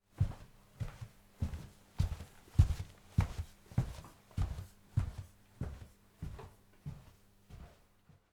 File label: Footsteps on Wood walking by